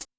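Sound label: HH PEDAL, Yamaha SY85 Drums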